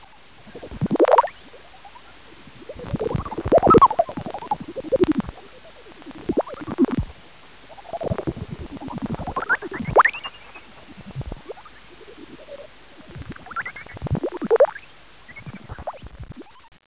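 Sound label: great horseshoe, bats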